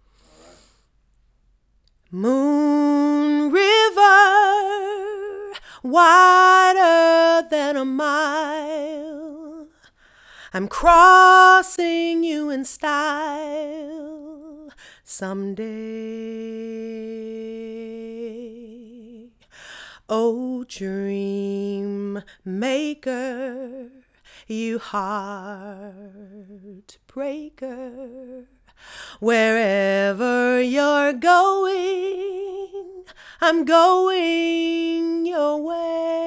female vocal

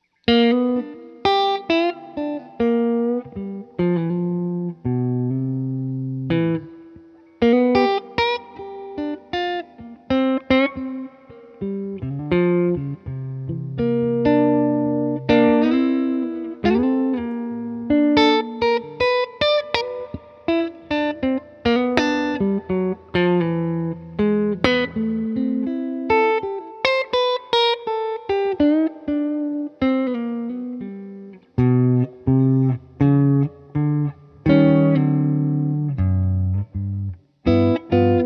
electric guitar clean

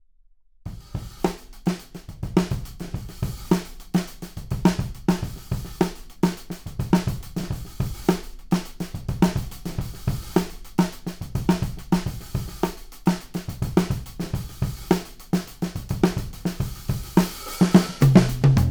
OVERHEADS; BOMBLET

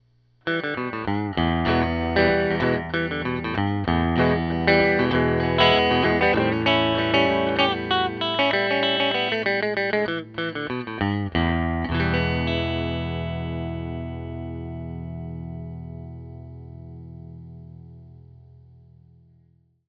EL GUITAR; BOMBLET

